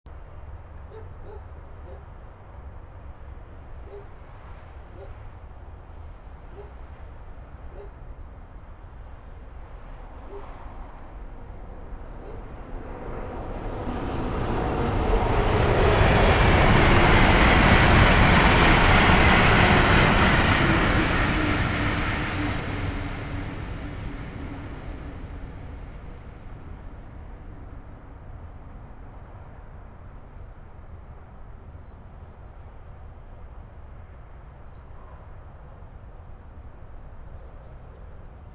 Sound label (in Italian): Treno; Eigenmike; ComunePR; NuovaStradaF